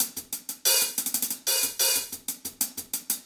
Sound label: hihat